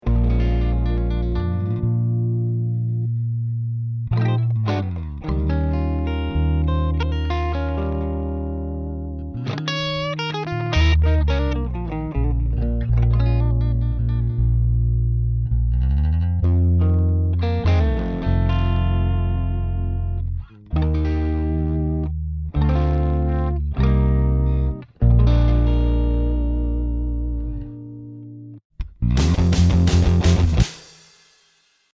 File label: Guitar Blues Intro